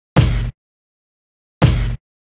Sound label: Kik Samp; pitchshifter PSI